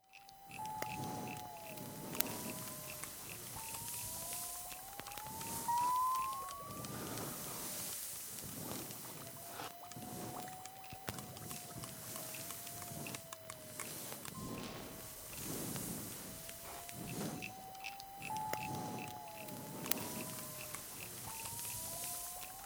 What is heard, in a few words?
nature